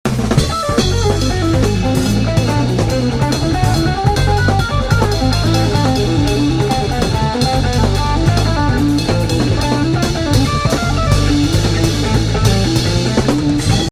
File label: lick; troygrady